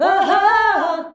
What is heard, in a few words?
"AHAAH" A, FEMALES; Best Service; Hyper Dance